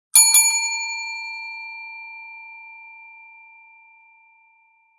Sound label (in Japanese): 呼び鈴 二連続